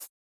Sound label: Catch Blade; Boomerang Fu